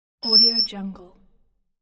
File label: high metallic squeak